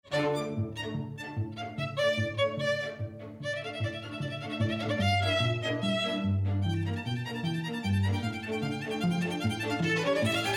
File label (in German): Quartett